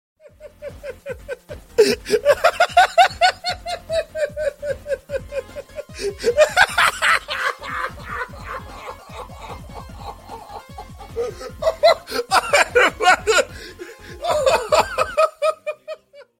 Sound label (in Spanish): risa